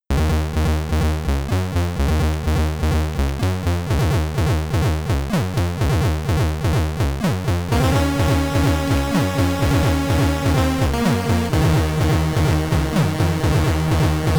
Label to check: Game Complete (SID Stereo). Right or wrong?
Right